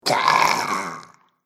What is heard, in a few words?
Zombie - Mostly Legless; Zomby Sound Effects; horror